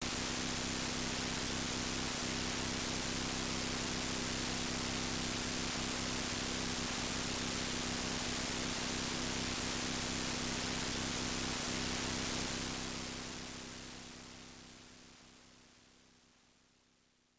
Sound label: Shower; Akai Suishou no Hitomi; PC-98